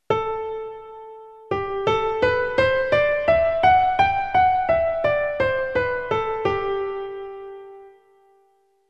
dict escala

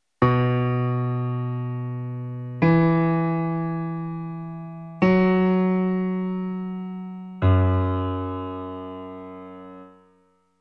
graves si m

